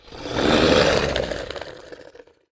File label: snarl